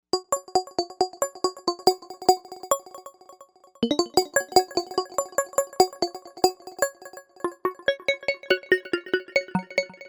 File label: Glass Pluck, Novation UltraNova; Factory Patches